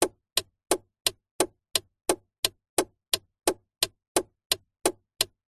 vehicles car suv nissan patrol 1998 indicator blinker hazard lights clicks